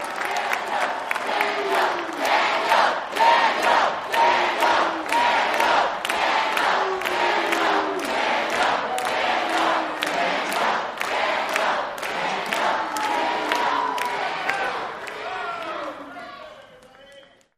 Crowd Chants

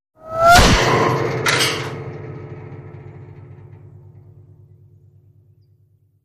Bullet with Whir Impa, metal

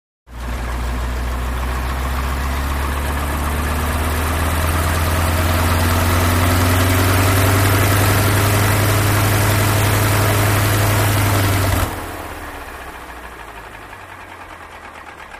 Stearman Throttle

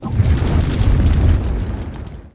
Dragon Fire Whooshes With Fire Crackle Element

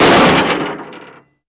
Metal Impact Rattle

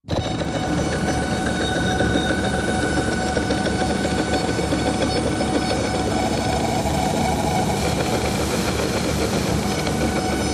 Device Machine, machines winches

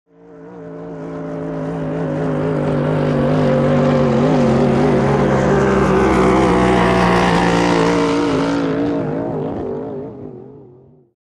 In Outboard Boat By, motorboats

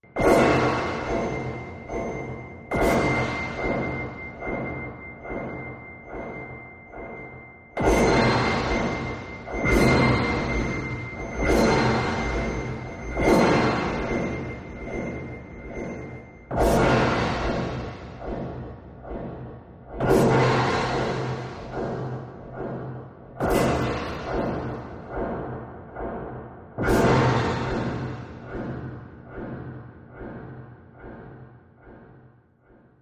Laser Loader Machine

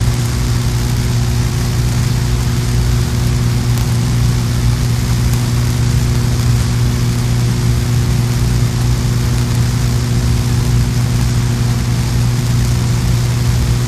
Power Plant Transfo, buzz & hums

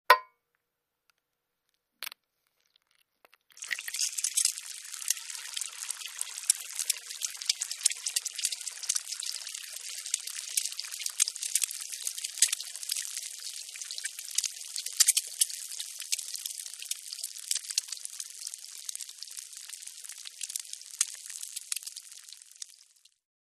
Egg Crack Fry W Grease

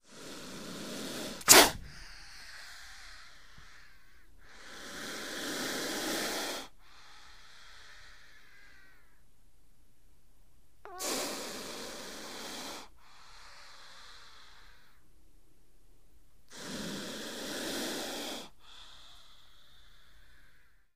Cobra Indian Snap, snakes

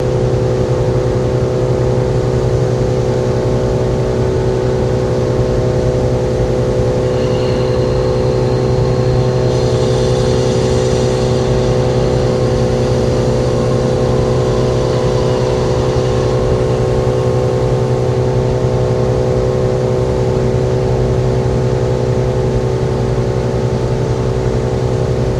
Machine Hum Int, dam interior

